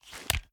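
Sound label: pistol raise first end; gmod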